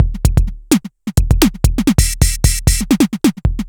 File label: Synth Loop, BPM130